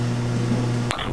gear; Flying Nightmares Demo; Flight Sims

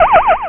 phased; Exile III v1.0

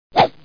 flip; Bible Fun Pack; MAZE

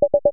Player up; Realmz 4.0

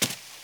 ladder step; wob2 demo

